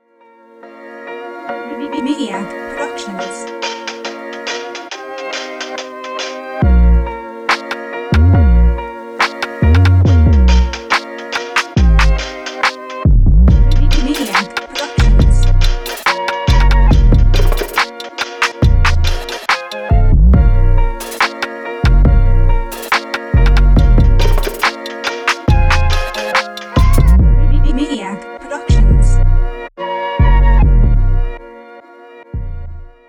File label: All Flutes